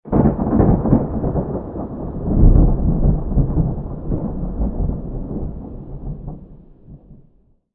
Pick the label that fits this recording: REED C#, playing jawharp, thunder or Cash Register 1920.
thunder